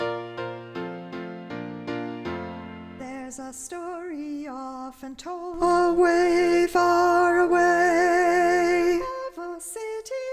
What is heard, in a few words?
Alto; Hear bars 119-131 with just alto sung; 2018 Weedpatch